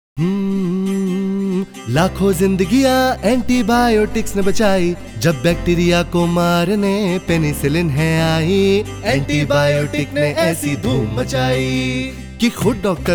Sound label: Antibiotic Jingle Urdu; jingles in 19 languages